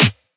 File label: snare oneshot; GhostHack